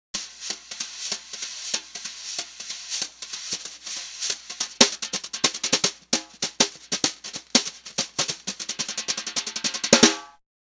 side drum brushes

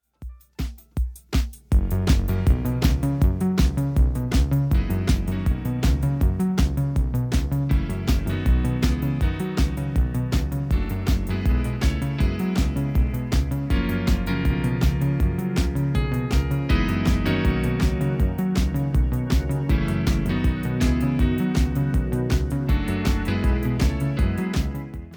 riff

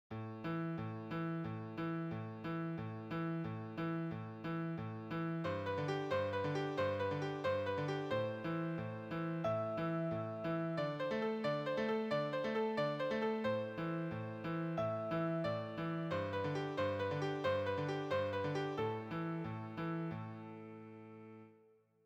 three against

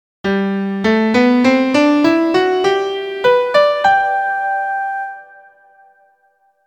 ascending

